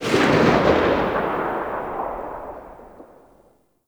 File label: Sniper Alt Fire Tail